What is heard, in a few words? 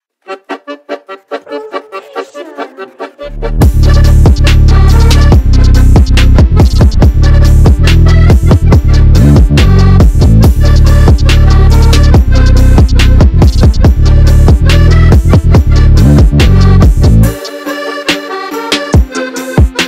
trap remix